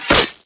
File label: melee; damage; player; physics